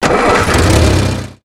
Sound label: Vehicle Engines; Red Orchestra Ostfront 41-45